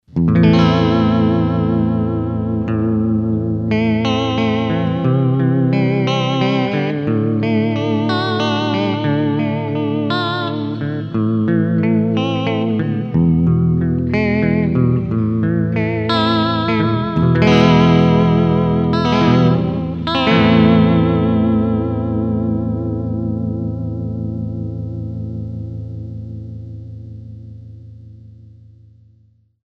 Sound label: VIBRO THANG, Digitech RP200